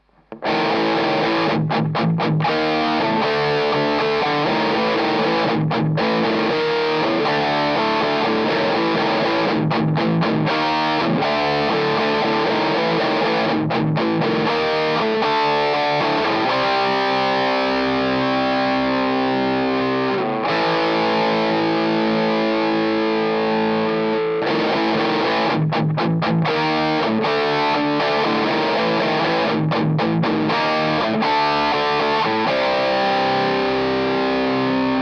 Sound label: normal tone test